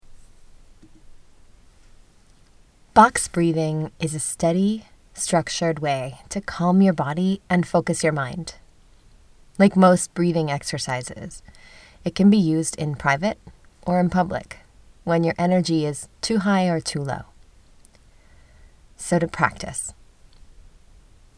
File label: Box Breathing